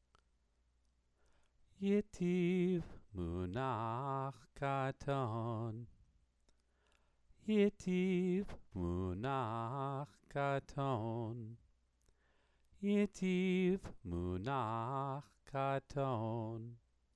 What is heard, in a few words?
Megilat Esther exercise